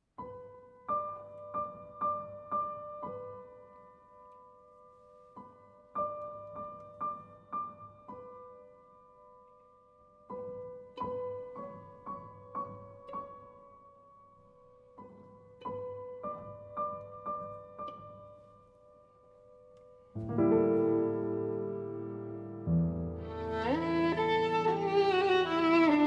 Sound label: Sonate Pour Violon Et Piano Intermezzo; Poulenc